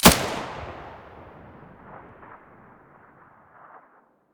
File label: muzzle stereo